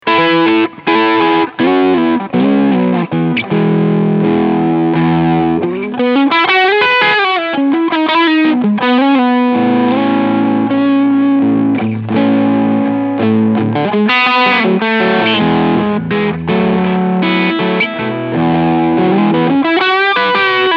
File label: MXR Timmy Switch left asymmetrical Clipping Full Gain